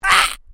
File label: MALE VOCALIZATION ARGH